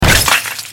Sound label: wood break